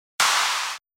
Explosion

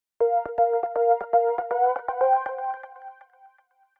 Something is begin

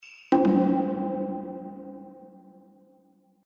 Bath tub Low

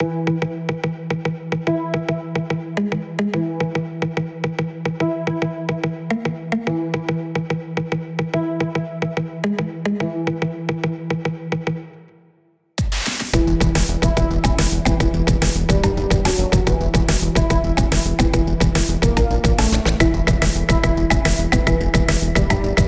acid wind

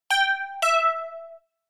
Correct long

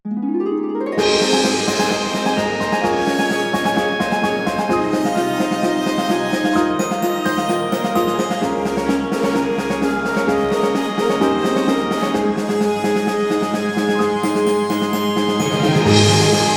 meadow gallop orchestral ver

